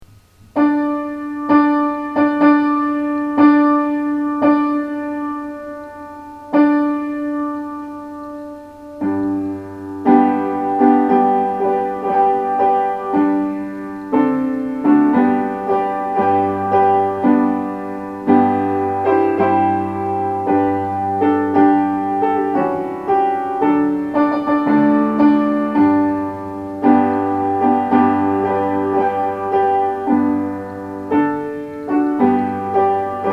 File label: Wagner Wedding March Piano Short